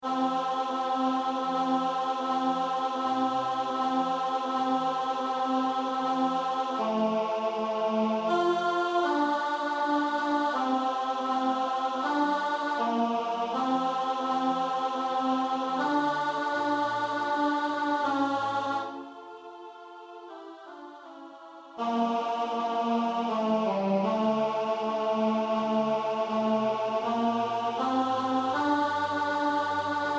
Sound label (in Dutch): tenor; Motetten; Sancti Dei